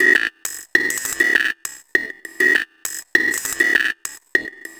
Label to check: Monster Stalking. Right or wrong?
Wrong